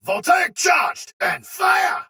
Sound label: attack soldier sword agent male; Dialog; Barks; Battle; Melee; Ode Tech; Sinecure